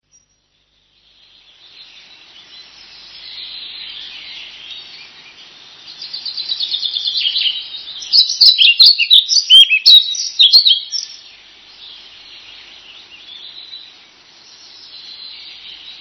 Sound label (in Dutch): vliegenvanger